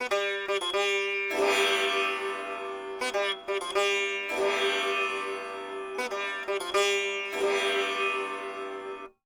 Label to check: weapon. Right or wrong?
Wrong